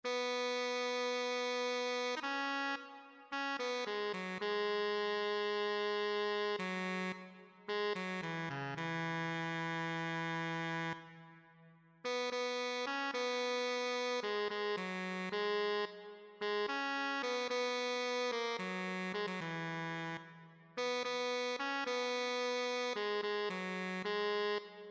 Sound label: tenor saxophone